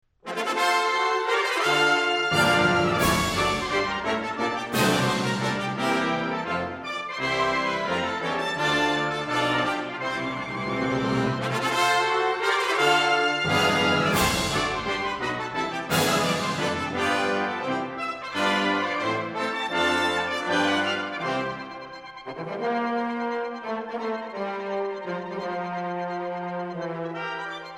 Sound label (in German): Vorspiel, Brass; Lohengrin; Act III